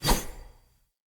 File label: Dagger Whoosh; Weapons; Swords; Fey